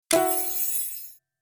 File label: Reel Stop Win; slots; Chic Town